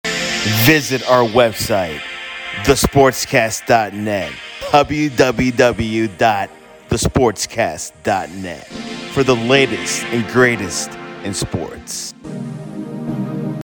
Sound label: jingle